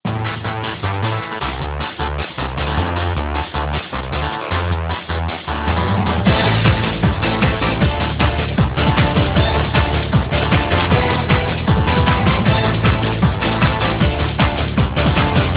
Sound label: ringtone russian techno